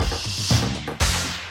shhh

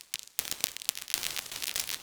loop klikk

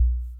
d kikk

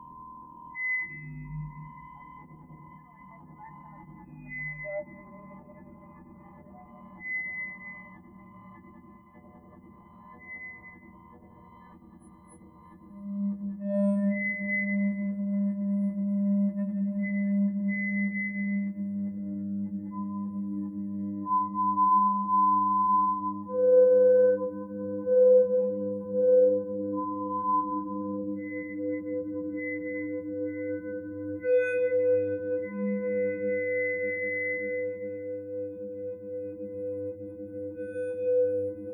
radom tones

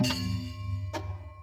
folk

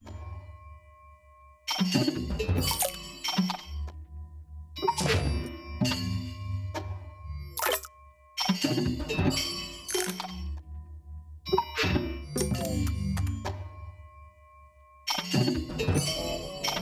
folk

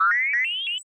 s osc

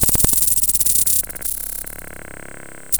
d fill

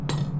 bonk

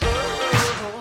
"uhoo"

